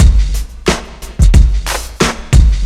JZ 90 DRUM, ACID JAZZ; Best Service; Advanced Media Trax Modern Composer

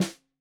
Snare 65 Reg Stereo, Deeply Sampled Drums

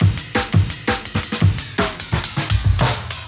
Deck Stop, Loops, breaks; M8

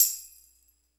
TAMB; Roland LA; ETHNIC

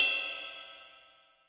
ride, Zoom MRT-3B

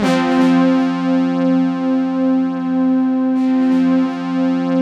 C4, SynthLine; 90 s Sample CDs; NiceBeats; SynthLine VOL-5

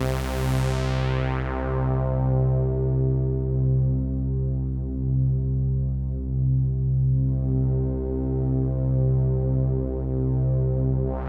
Pad C, Synth Prophet One Shots; Electronisounds

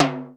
DRY P TIMB, drum; Crypton; DrumBase5000

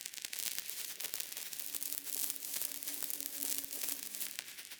Cracklature, sparse soundscape

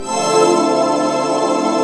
Pad Life Dm9; Proteus 2000; Digital Sound Factory Proteus 2000

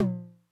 Tom Hi Accent High Tone Max Decay, 909

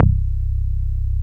PRESSURE C1, BASSES

